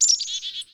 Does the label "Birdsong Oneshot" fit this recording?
Yes